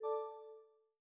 Windows Exclamation; GMM Windows 10

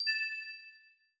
Windows Notify; GMM Windows 10

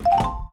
Box Shuffle; The Jackbox Party Pack 2 - RU

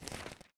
Windows Recycle; Glass

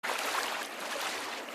swim; Indev+ 0.3 Portable

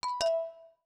Windows Logoff Sound; Pearl

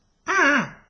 ostrich; Indev+ 0.3 Portable

